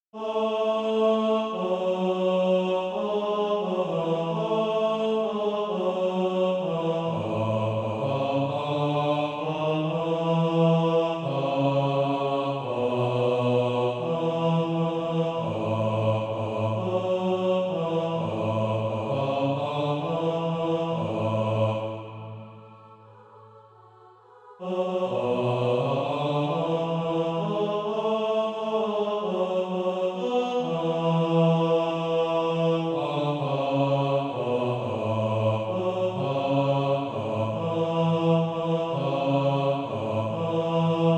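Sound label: bass, Sheet music (SATB) including Practise Music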